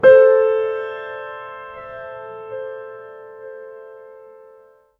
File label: gentle piano or soft stri, classical